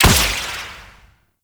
Spike Gun Shot; Infested; Zealoid Prelate

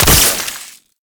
Proj Explosion; Warframe; Weapons; Tenno; Oraxia Flechette

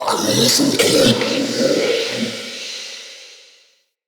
Male Vocal Charge Scream, Enemy; Mockery; Mockeries

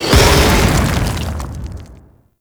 Rock Elemental Explosion; Warframe; Warframes; Brawler

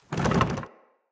Cage Rattle; Avakot Ode; Level Events; Midrath

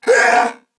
Pain, Male; Dialog; Barks; Battle; Corpus Venus; Warframe; CorpusVenusMaleA

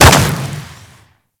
Pulse Exp Impact Default; Warframe

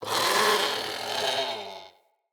Creature; Ambience; Corrupted Ode Three

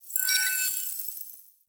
Choir Chorus End

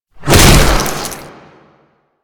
Proto Infested Ancient Small Arm Swing; Abilities

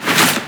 Unearth Proj By; Octopede; Warframe; ManInTheWall

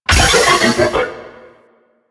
Shell Pillar Door Open; Warframe; Corpus; Railjack; Comet Shredder

